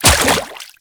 Step Water, Footsteps; Warframe; The New War; Characters; Kahl

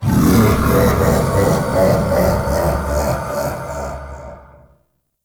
Laugh; Warframe; Entrati Doppelganger

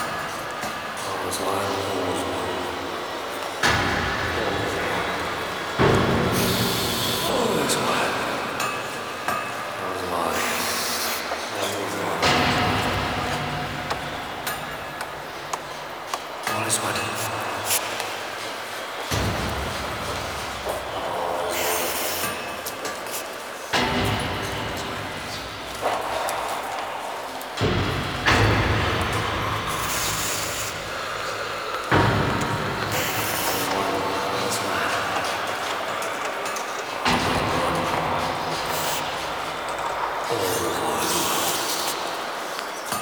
Ambience Loop; Warframe; The New War; Orb Vallis; Narmer Fortuna

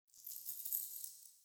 Idle Head Shake

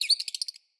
Night Creature Close; Night One Offs; Ambience

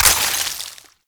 Ammo Pickup Cold; Ammo Ability; Warframe; Warframes; Frumentarius

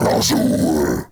Cast Vocal; Warframe; Uriel; Infernalis

